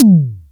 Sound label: Mid synth tom, Roland MC-303 Toms; Drum Samples